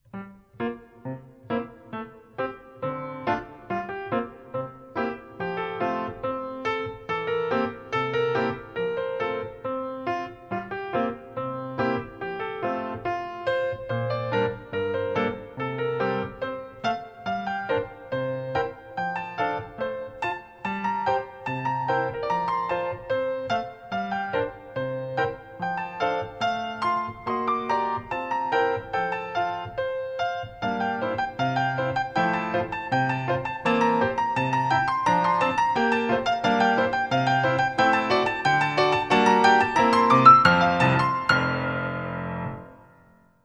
Battements tendus battements glissés

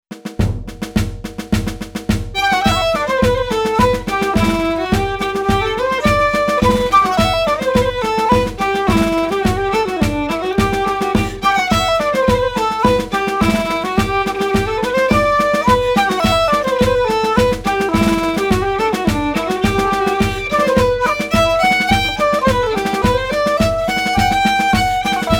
Galops and Skips Continuous B

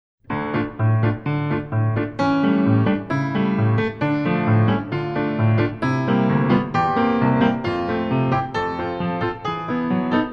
Cabrioles And Quick

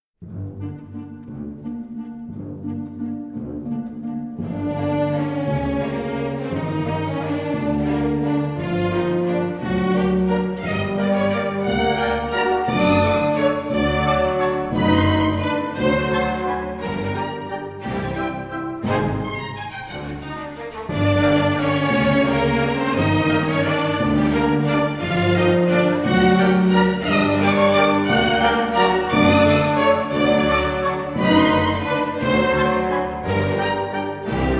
Waltz; Transfer Of Weight; Waltz No. 19 From Cinderella

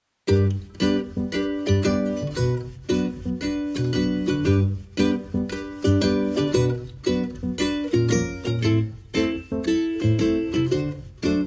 Slow Rolls Extended Music

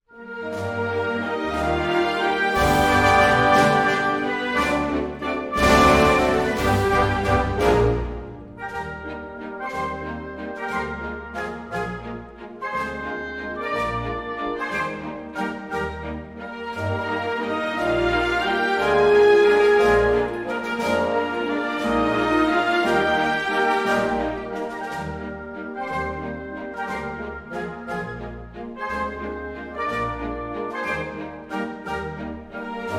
Pliés; Wein Weib Und Gesang

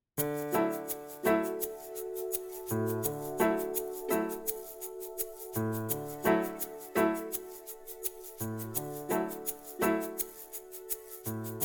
ACCOMPANIMENT

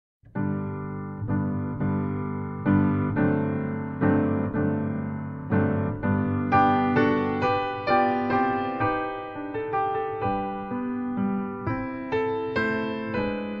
Adage Female

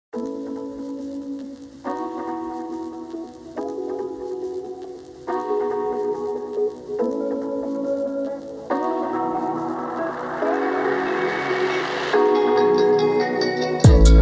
Travelling Time Step and Break Music

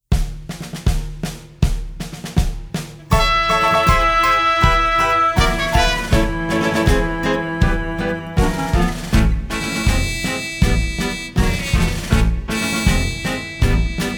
Goose March

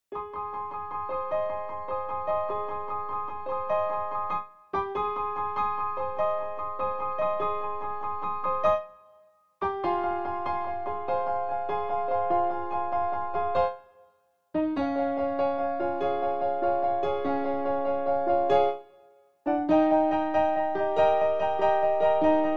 Piano Accompaniment Da Doo; Little Shop of Horrors

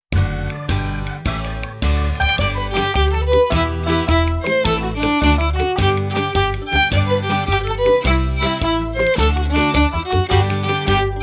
Galops and Skips Solo A

